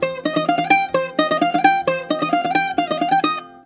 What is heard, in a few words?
130, BOUZRA130, Mediteranean Traditions; USB Soundscan vol.53; AKAI